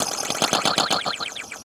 Wibble Wobble; Zero-G; Soundclash Nu Dance Electro; Acidized Wavs; FX Atmospheres

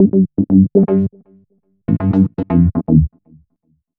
E-Bass + Analog Synth; Sonic Foundry (Sony Creative Software); Leo Cavallo Bass Taster

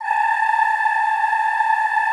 A4-R, Extended Classical Choir; Best Service

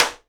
SIDE STICK, Live Snares

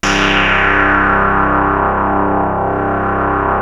PPG C1; Hollywood Edge - Giorgio Moroder Rare Synthesizer Collection; PPG.2.3

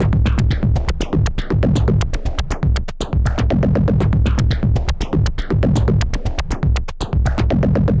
Anabeat Mix, Beats; Sonic Foundry (Sony Creative Software); Prototechno

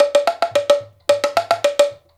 108 LAMBA, African Ethnic Drums; Spectrasonics; Supreme Beats

